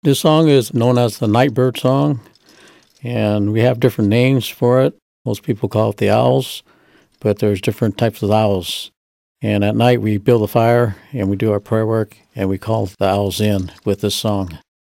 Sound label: night bird song story